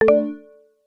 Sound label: win